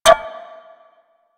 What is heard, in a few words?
Impact Message Alert, UI, HUD; Warframe